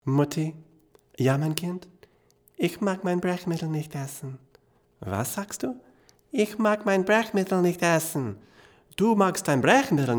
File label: German; AMI KM100f